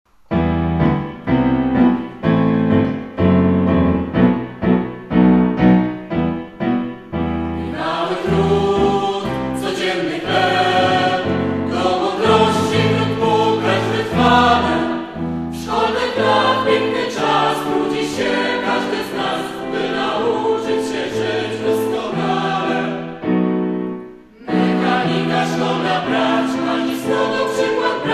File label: hymn